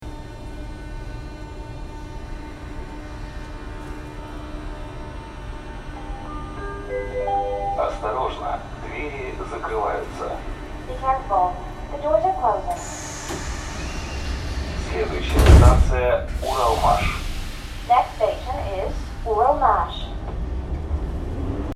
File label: underground